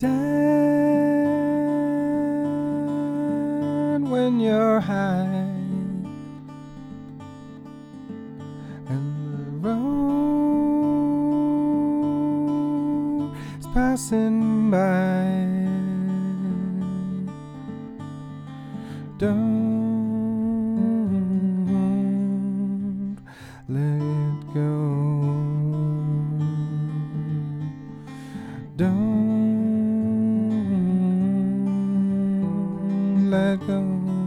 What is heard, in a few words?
Subsonics Vocal